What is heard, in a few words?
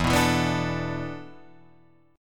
E 7th Suspended 4th, Guitar, Drop D